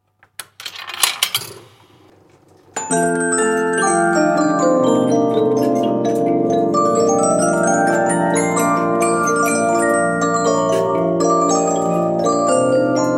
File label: polyphon